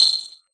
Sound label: Glittering Clink